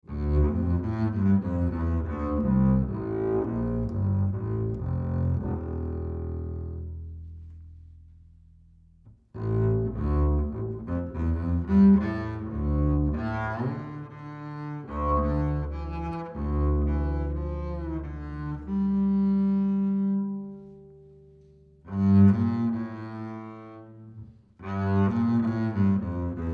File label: Ac Bass 25cm R Bowed High